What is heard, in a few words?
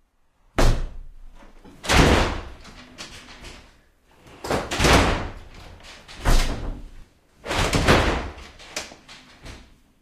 door bang; chungo